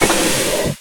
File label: door metal large open; Dystopia; doors